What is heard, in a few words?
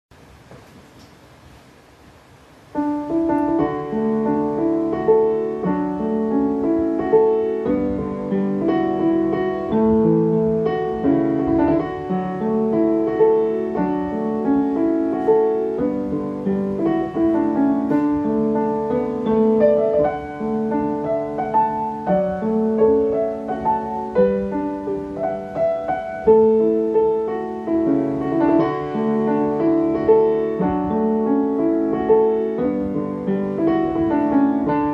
Mini Piano Arrangement; Inisheer (Inis Orr); Thomas Walsh; irish slow airs for the pianoforte